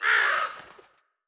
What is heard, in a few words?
Windows Error